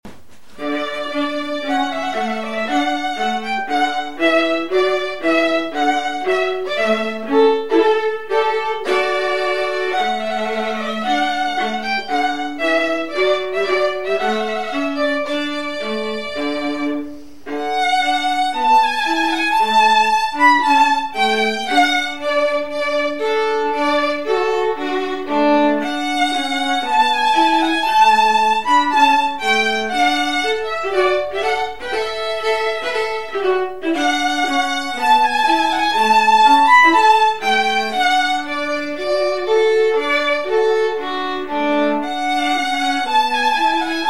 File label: Violin viola